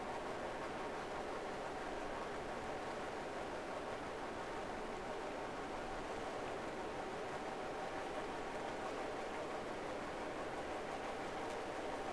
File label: Whales; SeaSnake